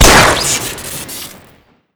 glock fire crit; tf2classic

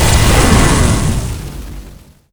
shoot, weapons; tf2classic

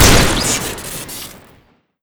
Twenty Six Shot Fire Crit, weapons; tf2classic

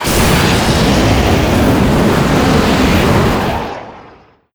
afterburner start; tf2classic

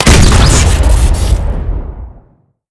fire crit; weapons; tf2classic

